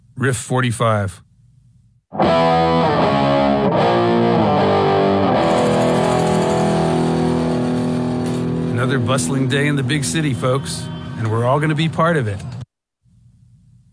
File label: Riff